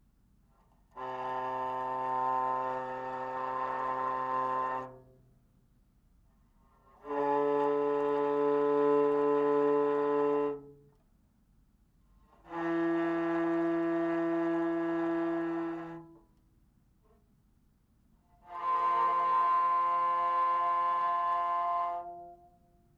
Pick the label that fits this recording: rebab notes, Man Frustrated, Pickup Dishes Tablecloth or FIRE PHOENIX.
rebab notes